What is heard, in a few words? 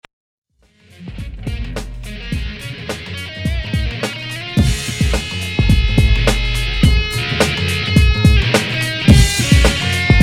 funky loop